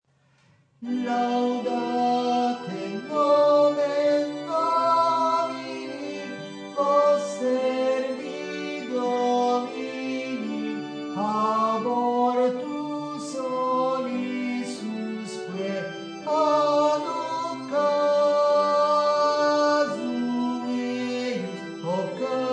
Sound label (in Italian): Laudate nomen tenori